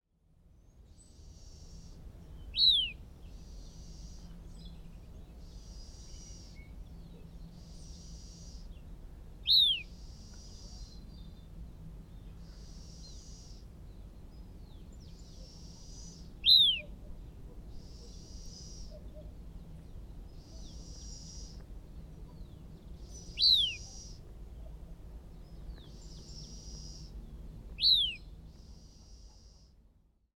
elaenia frantzii; Irazú; historia natural

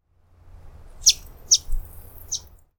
junco vulcani; Paramos; Aves; cerro muerte; historia natural; Galeria biodiversidad

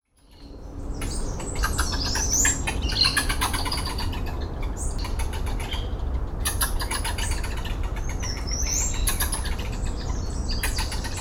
echinosciurus variegatoides, mamiferos; historia natural; galeria biodiversidad

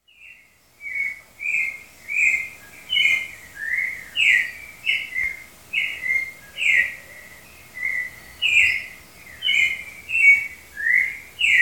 Turdus grayi, Cantos; exhibicion jardin; conservacion naturaleza

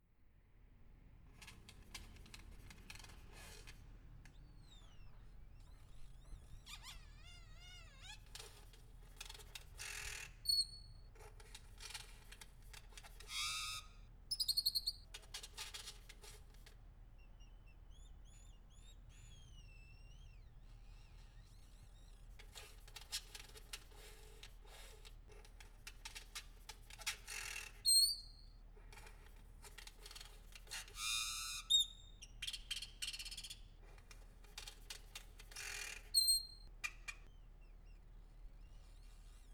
quiscalus mexicanus; aves; historia natural; galeria biodiversidad

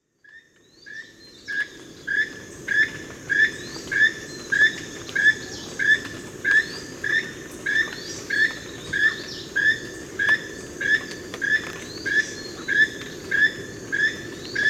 ramphastos sulfuratus; tucanes; historia natural

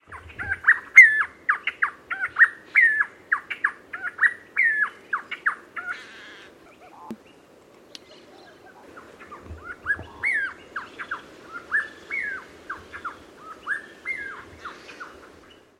campylorhynchus capistratus, aves; historia natural; galeria biodiversidad

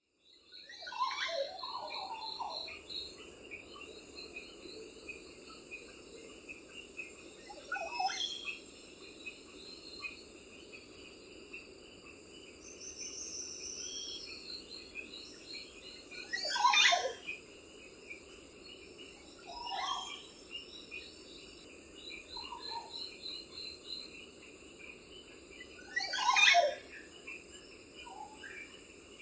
psarocolius montezuma, Aves; Gandoca-Manzanillo; historia natural; Galería Biodiversidad

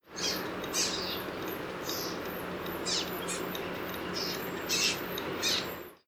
pinaroloxias inornata, aves; isla del coco; biodiversidad; historia natural

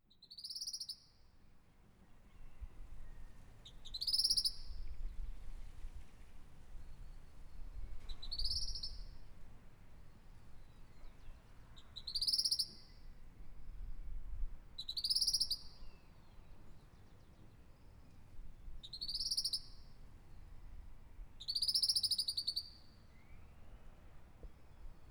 tyrannus melancholicus, aves; historia natural; galeria biodiversidad

